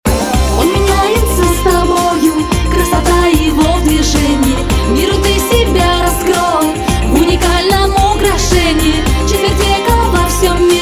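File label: vocal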